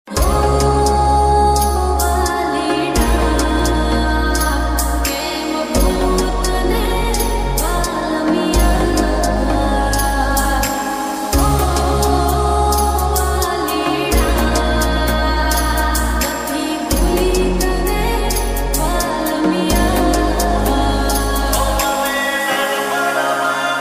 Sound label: gujarati ringtone